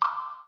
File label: water drop; hurrican